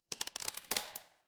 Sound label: glass crack